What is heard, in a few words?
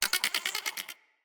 Ext Insect; Midrath Island